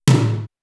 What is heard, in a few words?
Rock Tom Mid, Drum Machines, Clavia DDrum AT, Pitched Up